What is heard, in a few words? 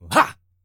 Male Attack Action Voice